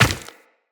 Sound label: Run Rock, Footsteps; Tenno